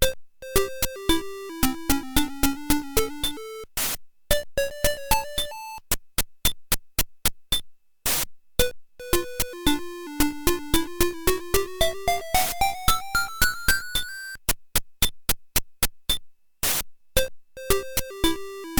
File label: Ice Theme; Wario Land: Super Mario Land 3